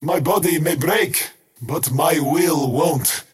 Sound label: skywrath crown deny pain; skywrath mage